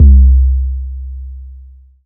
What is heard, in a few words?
BOOM, BASSDRUM, Techno 138 BPM